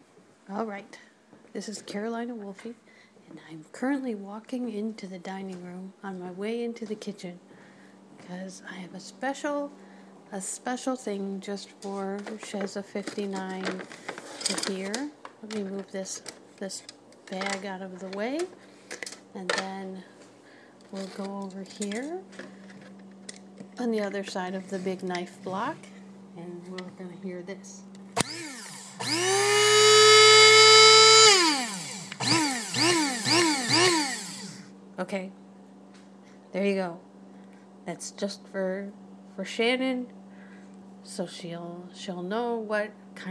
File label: vroom vroom